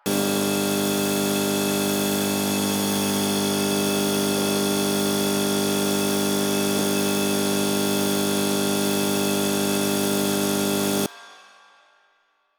Cave Light Bulb Loop; Ambience; Warframe; Stalker Cave

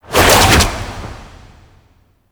Infested Tendril Whip Long, melee attack; Zeke; Warframe; Infested Lich

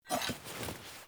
Blacksmith Hammer Lift Foley; Characters; Blacksmithing; Friendly; Tuvalkane

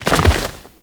Roll Forward Foley C; Enemies; Warframe; Teshin; DE

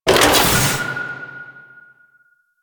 Place Charge; Warframe; Veilbreaker; Devourer Kahl

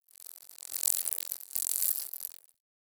Insect Fly By; Warframe; Infested Eris; Ambience; Outdoor

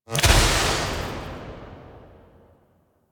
Impact; Warframe; Tenno; Grimoire

